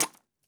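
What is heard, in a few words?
bullet case bounce 338 mud; gmod; viper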